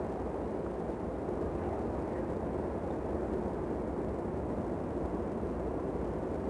wind canyon ambience; Star Wars Obi Wan; XBOX

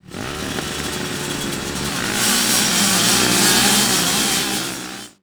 Start, UI

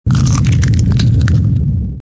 grip, force, weapons

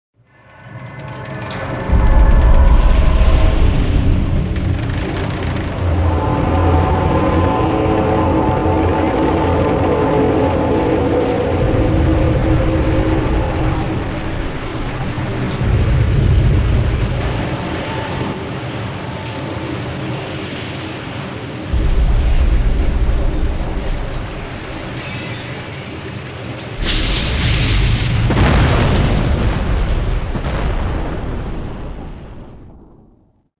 liner sink; Soldier Of Fortune II Double Helix; XBOX

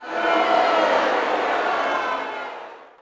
Boo, Crowd, reactions

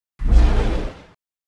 start, ships; leah

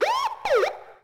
Cheat Incorrect; Cars; XBOX

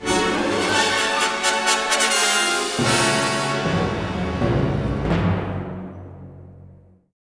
battle, music flourish

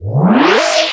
Prop docking; Star Wars Clone Wars; XBOX; Xbox Live Effects

